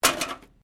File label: Can Softdrink Rubbish; various metal cans; Metal (Sound Effects:Materials)